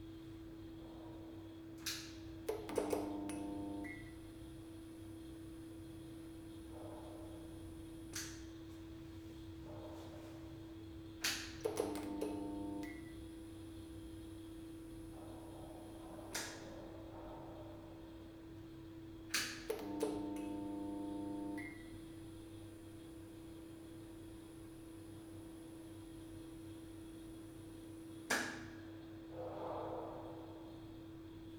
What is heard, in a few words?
hall light On Off loop; Audio Game maker Sound Library; worldsounds